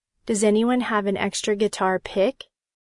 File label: guitar